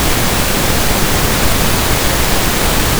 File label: pink noise; synth